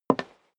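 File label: step high heels wood creak single left, footsteps; schritte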